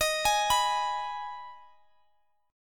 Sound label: D sharp Suspended 4th Sharp 5th D sharp, Guitar Standard; arpeggiated; chord; x,x,x,20,21,19; D#sus4#5; JGuitar